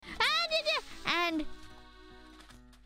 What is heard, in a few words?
screaming at creeper; IRyScream